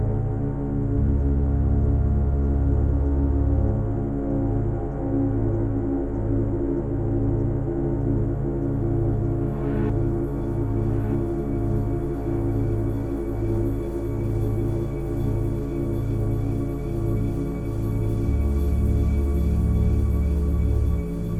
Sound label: The Boat Ambience; mirror's edge gamerip